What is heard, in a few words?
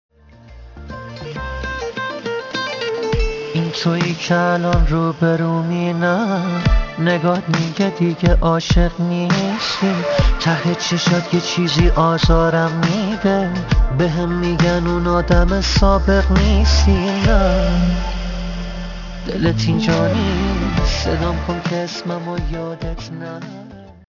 Ringtone